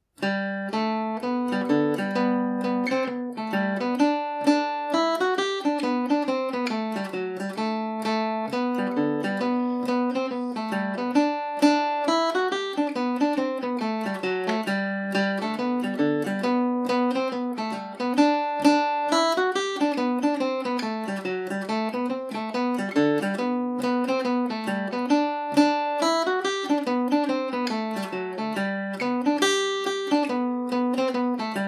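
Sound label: G major at 40, irish mandolin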